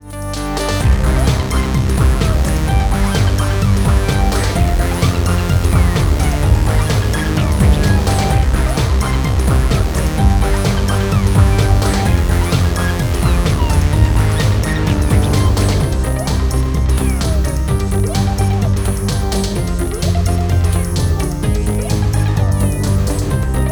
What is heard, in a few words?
Electronic